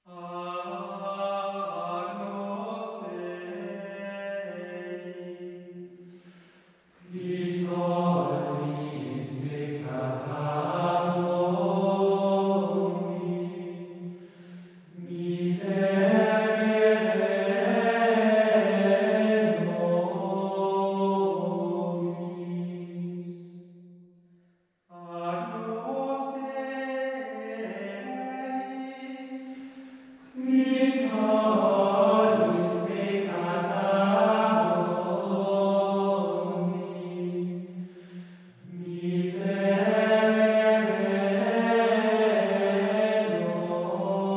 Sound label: Monks, Chant - Missa Latina, Agnus Dei; Ordo Missae in Lingua Latina